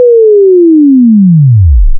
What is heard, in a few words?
chirp